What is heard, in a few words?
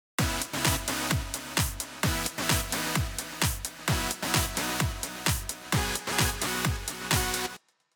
synth Pad Off